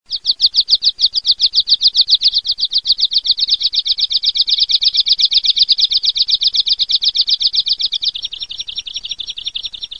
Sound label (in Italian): SPIONCELLO